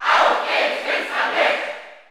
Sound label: Cheer German; Dark Pit; SSBU